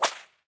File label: Whip Or Stick Beating